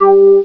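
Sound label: Flute; Prince of Persia Demo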